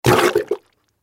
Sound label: Toilet Plunger, Toilet Plunger Water Gurgle; Toilet Plunger Water Gurgle Suction; Bathroom; Household; Blastwave